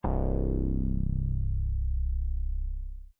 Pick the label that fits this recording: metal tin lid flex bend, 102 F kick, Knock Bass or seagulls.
Knock Bass